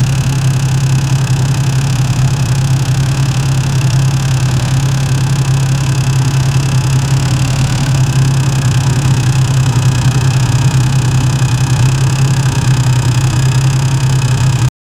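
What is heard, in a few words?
sports car engine deceleration; automobiles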